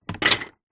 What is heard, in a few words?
leisure toy train wooden track pieces several place down in plastic box; zapsplat